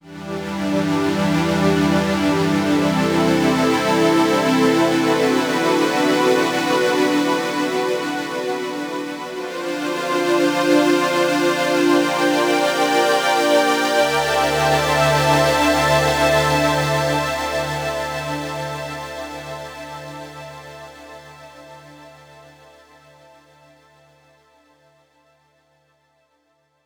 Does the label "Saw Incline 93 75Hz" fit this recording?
No